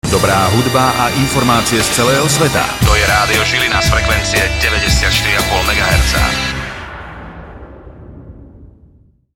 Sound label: jingle